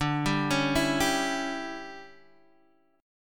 D Major 7th Suspended 2nd Suspended 4th, D, down, Guitar Open D